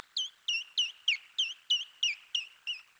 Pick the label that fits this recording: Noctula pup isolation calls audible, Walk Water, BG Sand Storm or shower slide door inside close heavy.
Noctula pup isolation calls audible